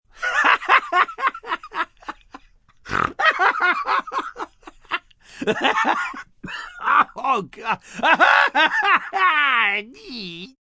Laugh Snort, Laugh, male, hearty, snorting (human voice); Laughter